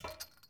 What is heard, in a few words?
pickup chainlink; Rust